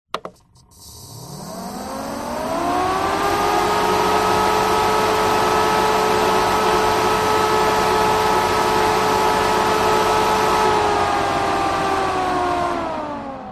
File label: air cooler electric on run off short rear pov inlet; zapsplat